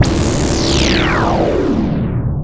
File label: Downsweeps